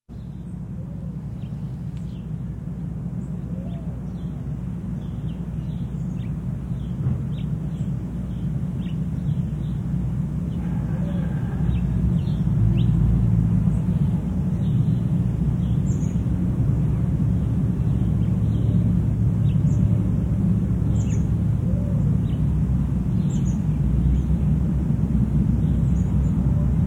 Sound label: Hummingbirds in the City